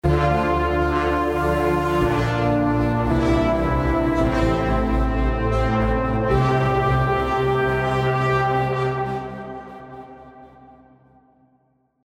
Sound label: Horn Textures